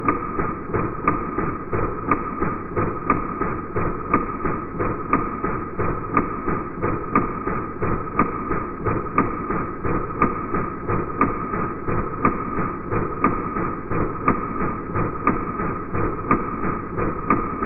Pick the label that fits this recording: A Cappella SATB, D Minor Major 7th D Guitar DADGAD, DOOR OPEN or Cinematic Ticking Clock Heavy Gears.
Cinematic Ticking Clock Heavy Gears